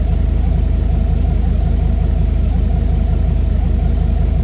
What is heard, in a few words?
idle, pickup